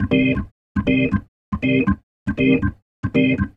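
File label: organ, Roots Reggae; B-3; Sounds Good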